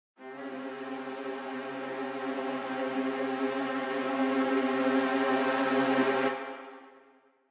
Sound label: trill